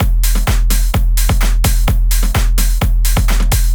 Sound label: Mainroom Kit; Jump